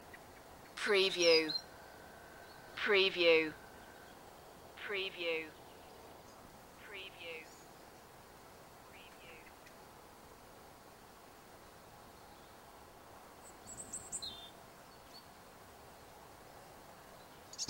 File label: light wind and birds loop long